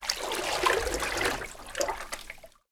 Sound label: water move